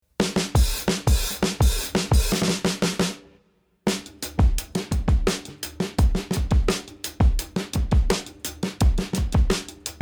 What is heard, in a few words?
loop